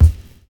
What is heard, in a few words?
VINYL BD, Drums Dance & Groove; USB Soundscan vol.11; AKAI; VINYLKIT